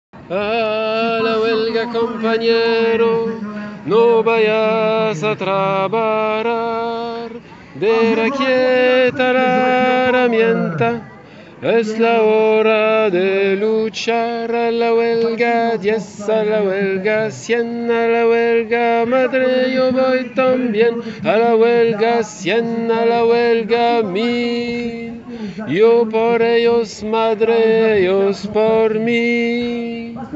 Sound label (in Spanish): Tenor huelga